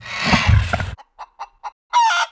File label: Hippogryph pissed